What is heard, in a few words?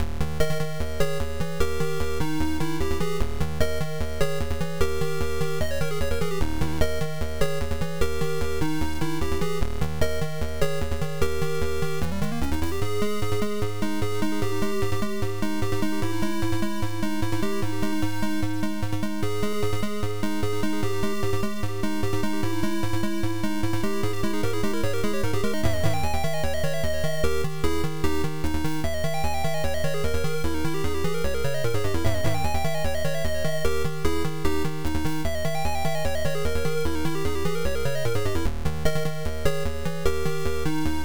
Ruins (Sad); Hunza; treasure of the usas; msx